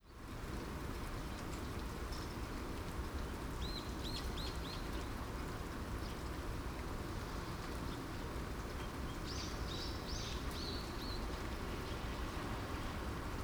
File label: light rain birds chirp rice field springtime